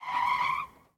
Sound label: skid short; Blind Drive